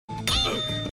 flamenco, ringtones, latino

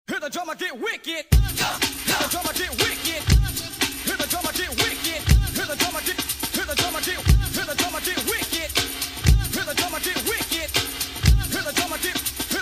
best hip hip; hip hop

